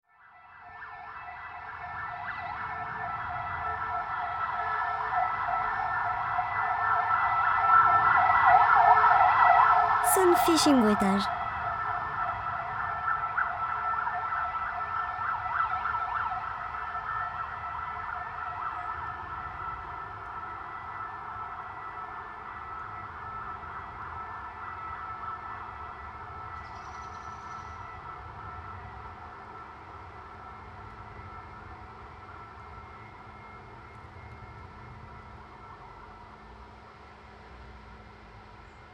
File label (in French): Sirene Ambulance